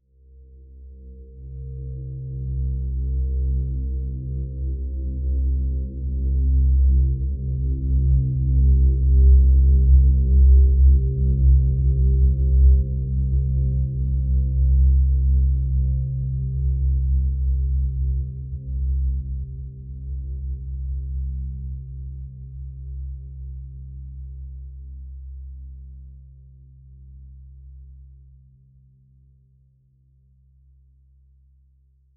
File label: Cinematic Bass Swell C